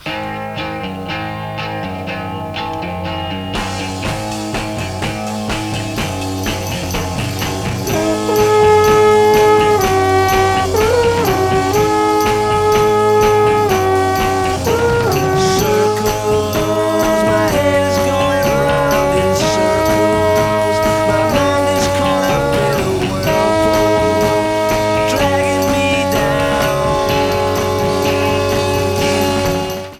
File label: Circles Mono Horn Mix